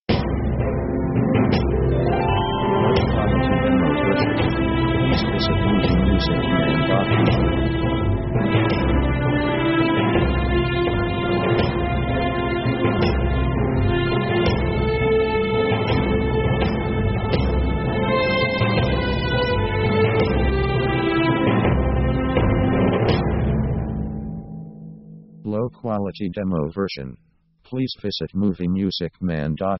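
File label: orchestra euphoric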